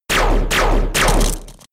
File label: Icicle Spear 1hit; Pokemon; Gen 5; Blk Wht Blk2 Wht2